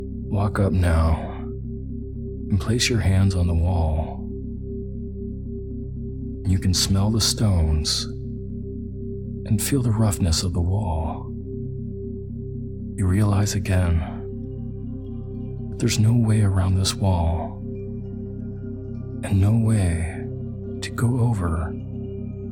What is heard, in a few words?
Removing Blocks and Barriers With Isochronic Tones